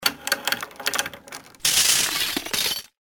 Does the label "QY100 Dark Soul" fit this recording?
No